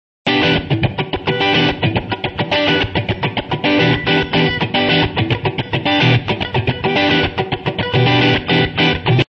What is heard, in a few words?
e funky; noisy and funky in e minor